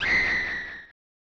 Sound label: scream; SNES